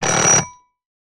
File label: household doorbell ring from outside; sound effects one